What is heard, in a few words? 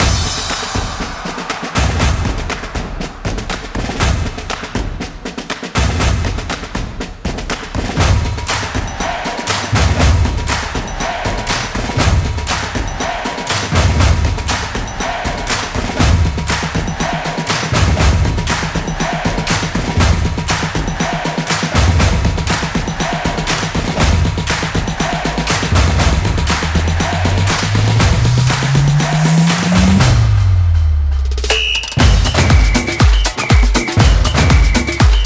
background music